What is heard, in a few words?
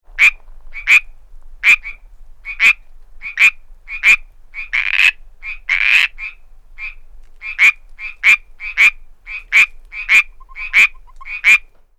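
pcadaverina group, frogs; advertisement calls of a small group of frogs, including warning calls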